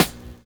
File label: BUZZ X; Univers Sons - Kitch 70s